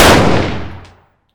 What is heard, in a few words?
fire dist 308 rif int